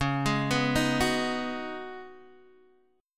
C sharp 7th Suspended 2nd Suspended 4th C sharp down Guitar Open G